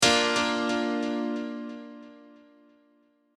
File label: piano